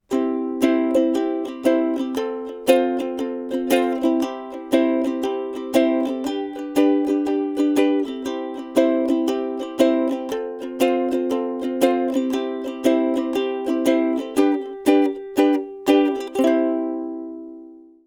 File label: ukulele flat; lct 140 air